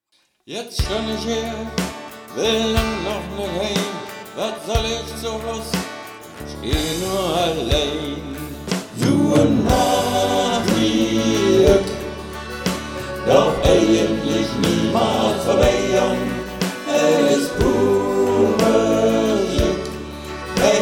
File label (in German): bass